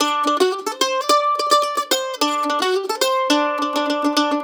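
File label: lick, left, blues guitar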